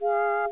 Ghost

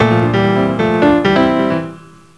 Piano

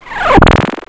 Nuke

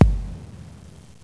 Drum

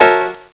Jazz Piano Beep

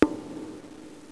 Drum

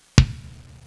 Drum

